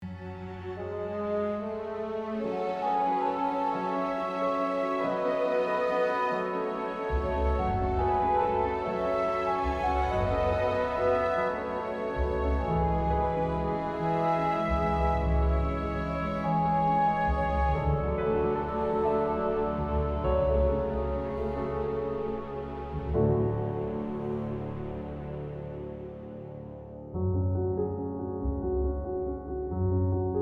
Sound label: Strings of Hope